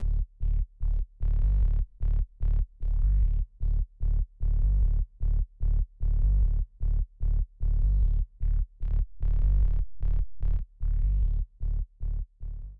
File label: synthe saw bass track